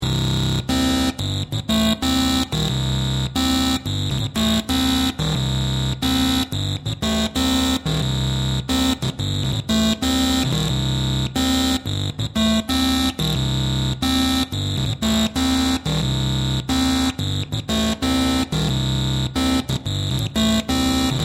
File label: wasp bass